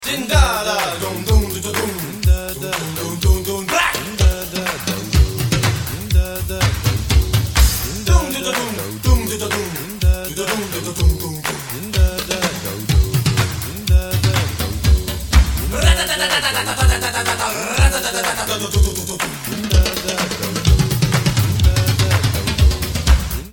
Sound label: Ringer